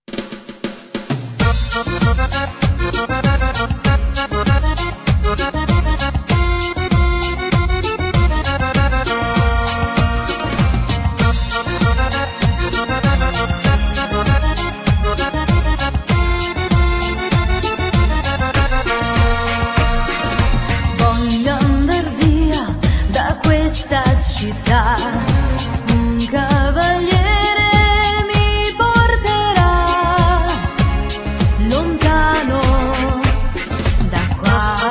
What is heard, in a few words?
Cumbia